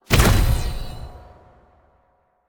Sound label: giant fire impact; smite; vamana